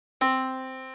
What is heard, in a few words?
yamaha Disk